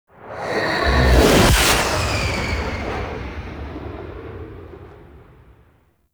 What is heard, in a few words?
Fly By Slow, Vehicles; Warframe; Liset; Zariman